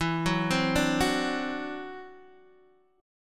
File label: E 7th Suspended 2nd Sharp 5th, E, down, Guitar, Open D